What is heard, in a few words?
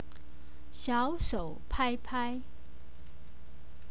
Small Hand Clap Clap